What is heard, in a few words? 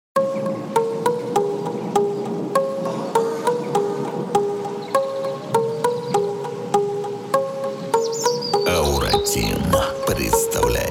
41 46hz Low Bass